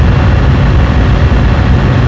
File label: All Acceleration